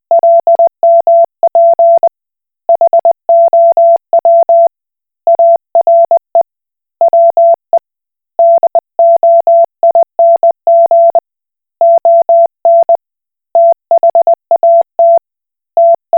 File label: Koch Morse